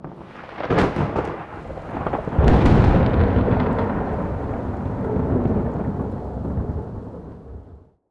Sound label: sfx amb vfx thunderclap; ambient; System VFX